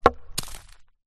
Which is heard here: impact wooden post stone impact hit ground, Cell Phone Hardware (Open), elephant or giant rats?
impact wooden post stone impact hit ground